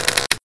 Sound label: crossbow bolt; sci arms